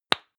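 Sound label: lipstick open hard, Bathroom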